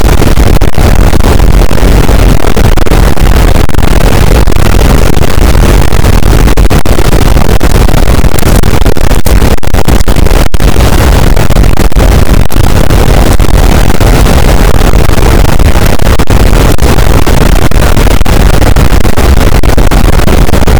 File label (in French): cachalot positif, boat; Physeter Macrocephalus